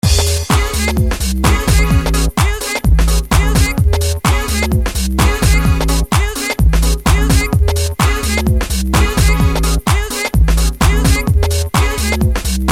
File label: speed garage